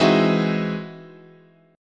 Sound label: E Augmented Major 9th, chord